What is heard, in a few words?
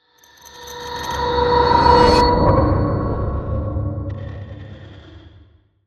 Riser Suction; Ascends; Sound Design